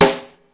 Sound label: Rimshot, Ensoniq ASR-X Pro